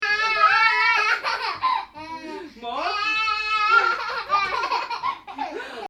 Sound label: 6-month-old laughing